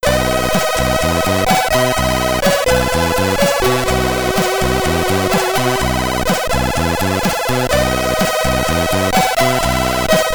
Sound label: Bonus Level; CJ's Elephant Antics; Commodore 64